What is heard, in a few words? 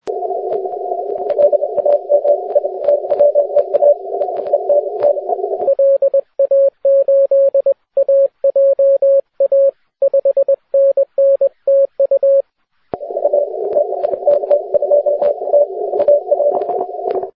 30m cw